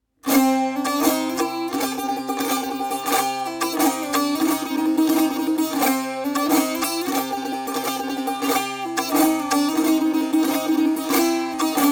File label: Shoor Tanbour Improvisation